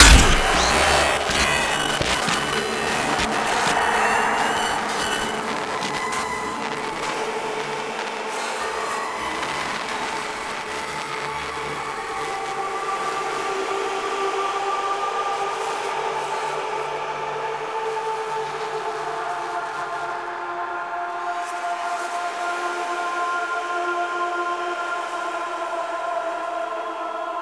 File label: Nursery End SFX; Orbiter Nursery; Operator; Cinematics